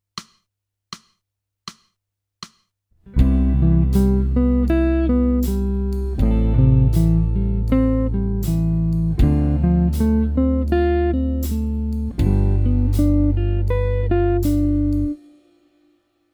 jazz

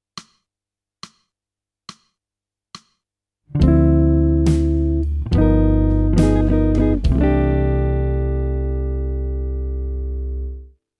jazz

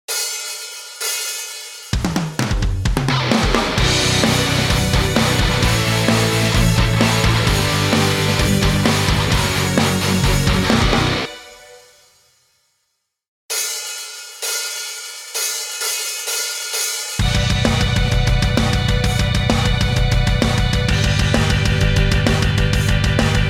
karaoke

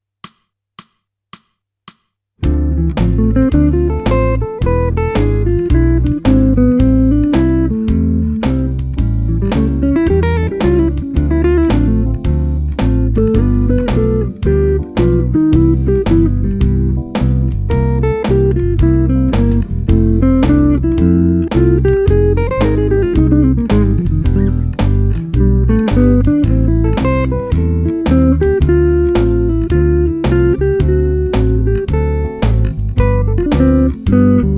jazz

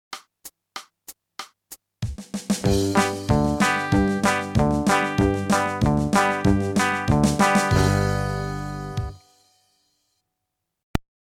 country

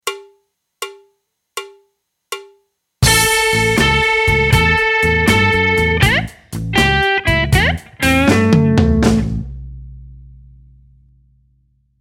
blues

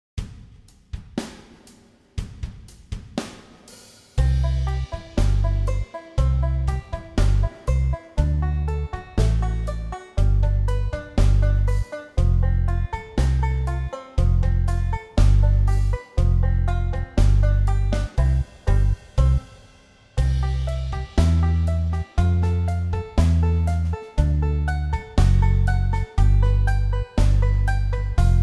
karaoke

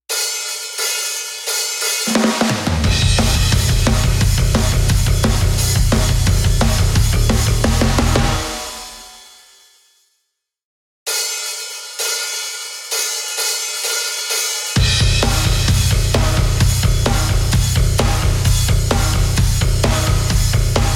karaoke

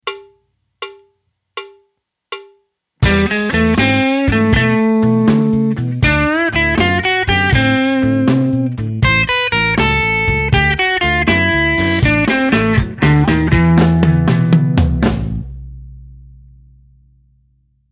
blues

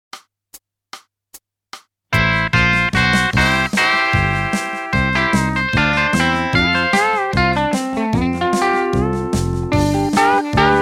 country

